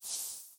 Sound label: Soul Pickup